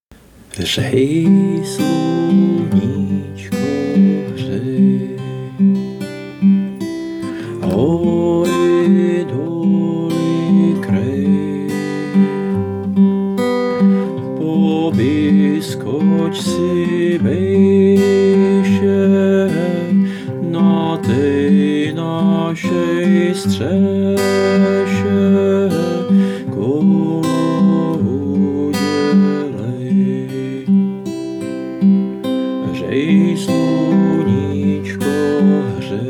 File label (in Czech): Akordy Text